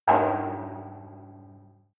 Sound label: metal impact tank inside